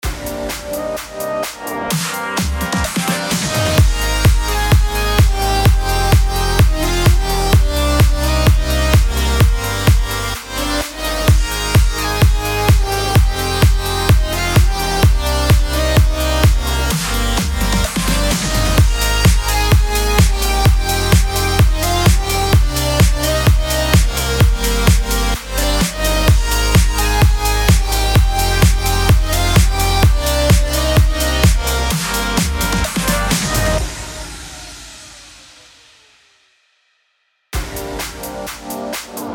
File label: BED PROGRESSIVE HOUSE G#MIN 128BPM